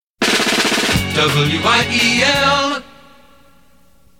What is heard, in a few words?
jingle